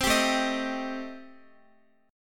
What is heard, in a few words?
A-Minor 7th Flat 5th, Guitar, chord; Am7b5; strummed; x,x,10,12,9,10